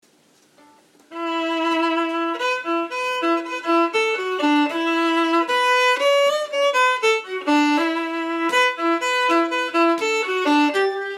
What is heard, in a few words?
jig; Morrison's jig